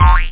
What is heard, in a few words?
homing missile; ptanks